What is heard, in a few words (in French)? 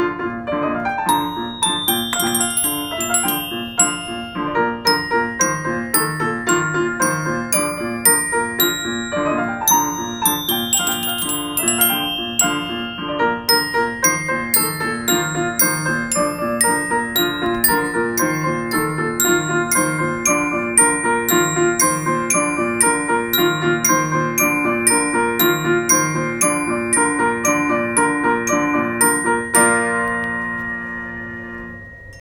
danse chinoise piano et xylo; casse-noisette